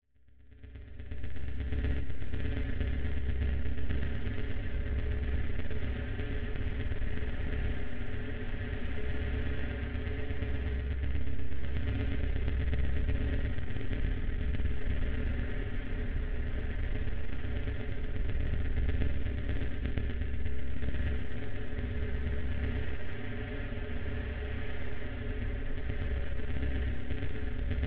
Magnetic Field; 99Sounds; Magnetic Fields

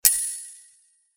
Sword Sound; FXHome; Fighting Sounds

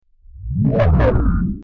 time start or stop; FXHome

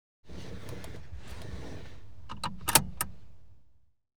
Car, Interior, Seatbelt Fastened; Auto; BBC Sound Effects Library

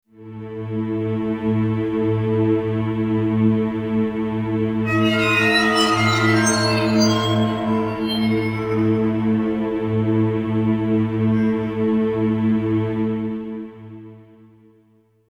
Orchestral Phrase; FXHome

